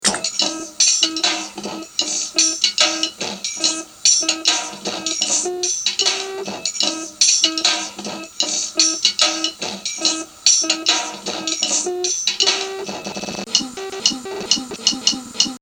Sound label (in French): 30 secondes de super cool batterie